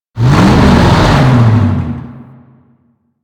Lasrian APC Move Start; Enemies; Warframe

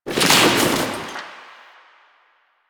fabric chair destroy; ambience; Warframe; Entrati

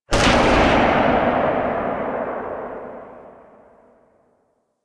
Sniper Tail, Weapons; DE; Warframe; Tenno; Quad Sniper

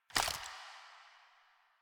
Pistol Reload Mag Swap; Weapons; DE; Warframe; Tenno; Lasrian

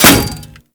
Bullet Impact Metal No Whizz

